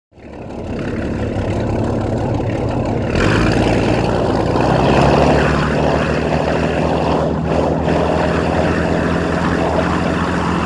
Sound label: Boat Engine Runs, powerboat